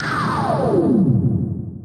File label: falling; deltarune; dark world